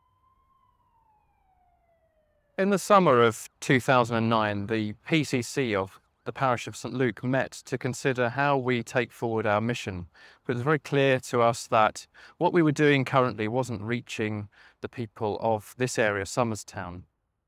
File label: Siren; dxRevive